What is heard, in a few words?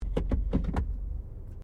CAR SUBARU LIBERTY INTERIOR GEARS INTO PARK; Family members Subaru; Cars (Sound Effects:Vehicles)